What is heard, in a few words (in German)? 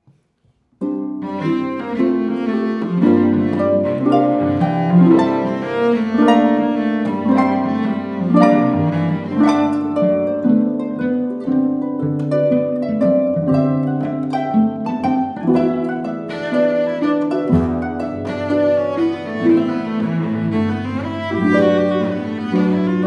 Harfe Cello